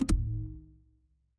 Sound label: feedback checkpoint